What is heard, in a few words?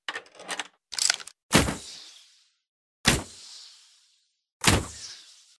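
crossbow reload and shots; perezar arbaleta i vystrely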